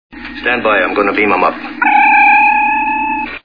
beam

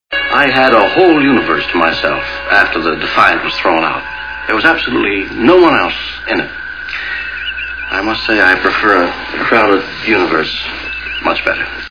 crowded

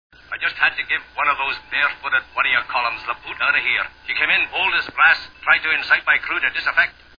boot

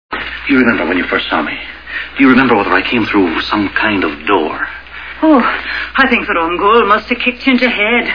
door

